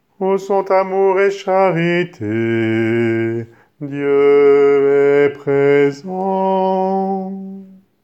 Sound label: ou sont basse